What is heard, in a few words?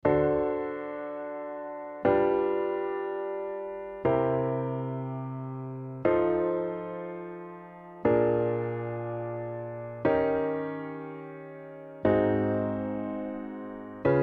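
D7→G7→C7→F7→Bb7→Eb7→Ab7→C#7→F#7→B7→E7→A7 60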